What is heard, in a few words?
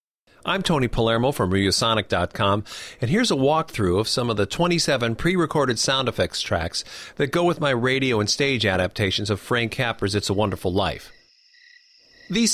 SFX cues; Tony Palermo; Wonderful Life